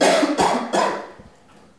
éternuement

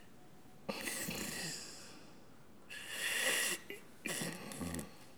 ronflement

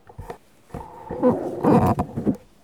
frottement

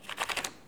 gommage

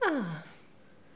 ah délassement

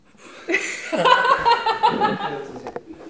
rire

